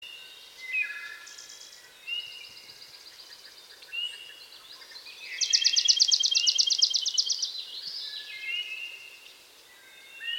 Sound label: Soundscape